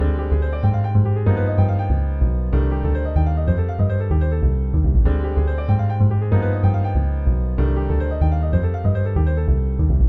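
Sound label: voicings with bass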